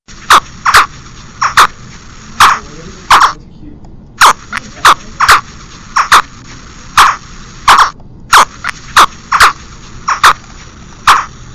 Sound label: baby alligator